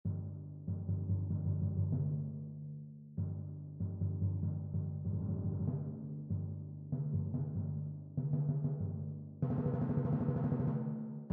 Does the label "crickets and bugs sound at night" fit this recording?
No